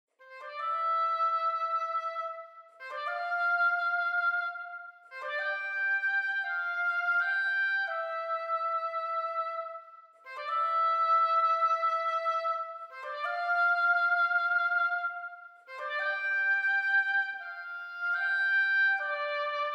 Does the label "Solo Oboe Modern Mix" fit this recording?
Yes